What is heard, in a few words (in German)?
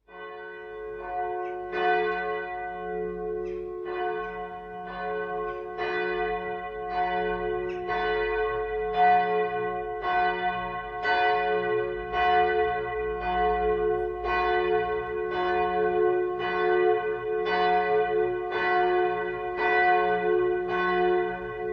Glocke fis, Domglocken; Andreas